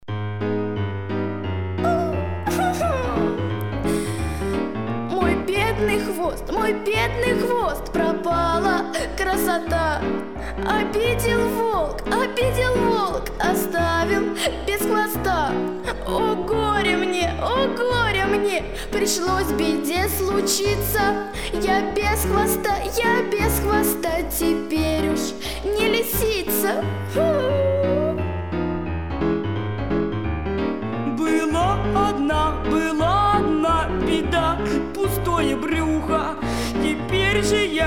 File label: Duet